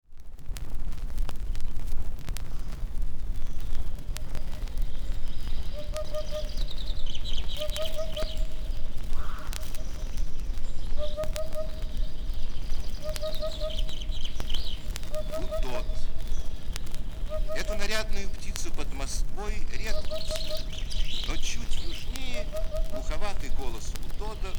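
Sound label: bird voices in nature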